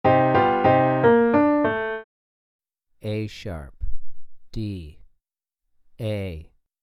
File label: 3 Note Mel Piano Min a#2 d3 a2; ear training three note melodic